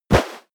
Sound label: step walk snow; schritte